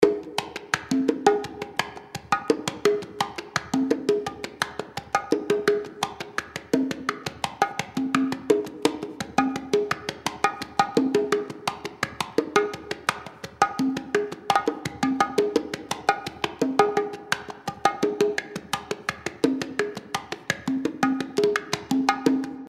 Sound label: percussion wet; akg bx20